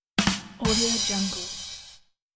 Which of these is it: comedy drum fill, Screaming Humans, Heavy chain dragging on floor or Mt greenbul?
comedy drum fill